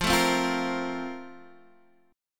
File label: A sharp Major 7th Suspended 2nd